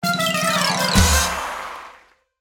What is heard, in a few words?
circus melody